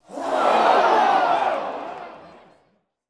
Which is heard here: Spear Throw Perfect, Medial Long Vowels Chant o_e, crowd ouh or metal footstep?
crowd ouh